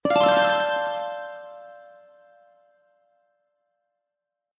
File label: show score; tetrio